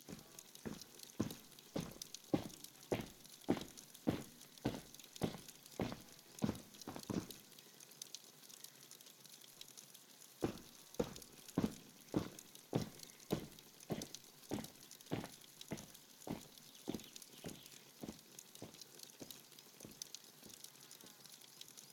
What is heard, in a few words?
AMB; BLIND QUEST - The Enchanted Castle